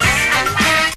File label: three horns, Stabs